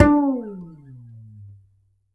Upright Acoustic Bass; Larry Seyer; SWITCHABLE POSITIONS

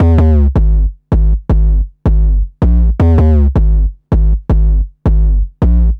Distorted Bass, Drum & Bass; Fluid Dynamics

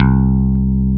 Bass Note C

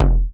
BD DOING, RY30 drums; East West; Global Sounds

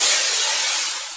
BRUSH STIR; Ensoniq ZR-76

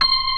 C6 FAST, DISTRT.B3; Northstar - New Gold VOL-1

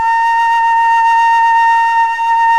FLUTE & BELL; OMI - Universe of Sounds

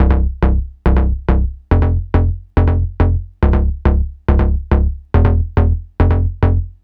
NRG Bass, Trance; Sonic Foundry (Sony Creative Software); Trance NRG VOL-1

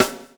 SLICK, Backbeat

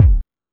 Techno E; EMU XL-7

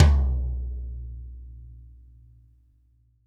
TD-20 Tom A, Roland TD-20 Toms

